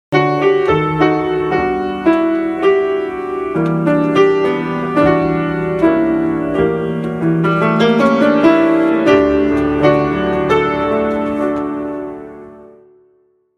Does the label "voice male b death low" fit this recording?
No